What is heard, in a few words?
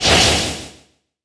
Ice Orb Flying